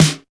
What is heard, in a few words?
SN, DRUMS; Best Service; Alpine Volksmusik